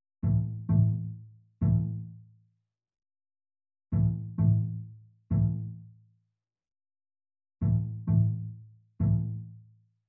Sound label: Strings